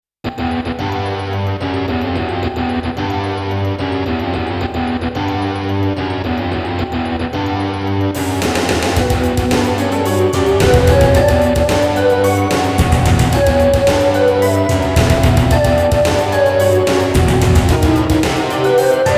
Cave; Ancient Roman Power of Darkside

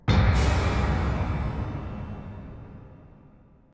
Tymp

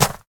stomp ice; Scrat

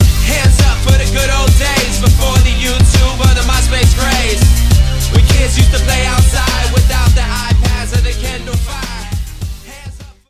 Stinger; Urban Central

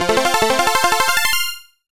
Round Clear Music; Toy Pop; Sharp X1 Turbo

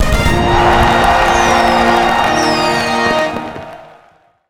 UI Victory Music; hero defense haunted island

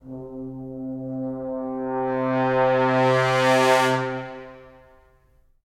Stingers Trombones; Hades

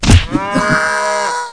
Cow Hit; Rabbids Big Bang; Android iOS Windows gamerip 2013

